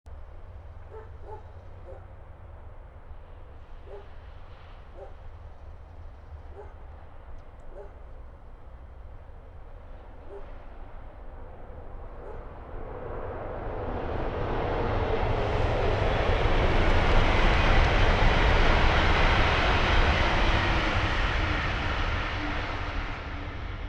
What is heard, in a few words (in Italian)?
Treno; Eigenmike; ComunePR; NuovaStradaF